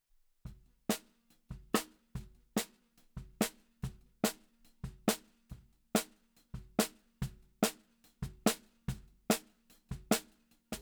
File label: Snare Bot